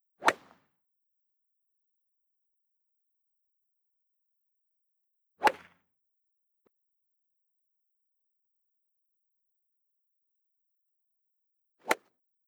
Miami Golf

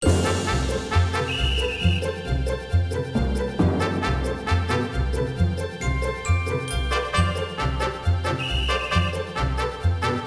Kitty taxi music

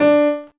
PIANO

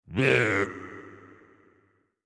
Effort Medium

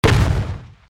bum shoot off; The Binding of Isaac; SFX Slots

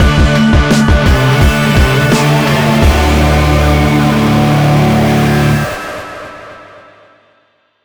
STING Complete Mission

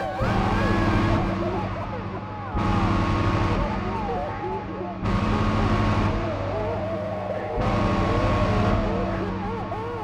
fireman music; Immure

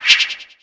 Wipe Echo; UI Wipe SFX Echo 01

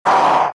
Monster Yell

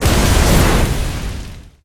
Fistball Last Hit; Disney Star Smash; Android, iOS, Mobile; Hades

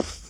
Foot Woman Carpet; disney star smash android ios mobile gamerip 2020

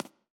Fly in land

